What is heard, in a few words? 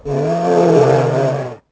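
scream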